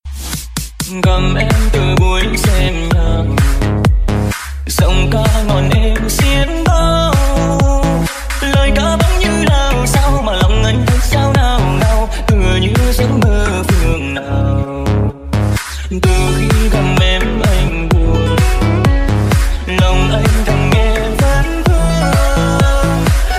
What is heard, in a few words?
Remix